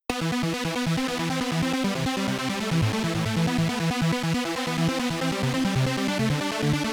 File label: Arpeggiator